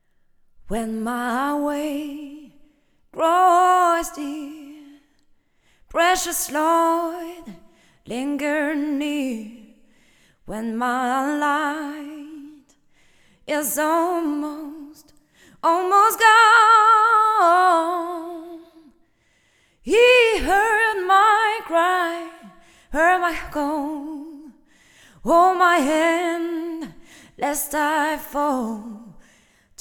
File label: jazz